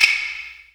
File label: percussion special; Ueberschall; Techno Trance Essentials; DRUMS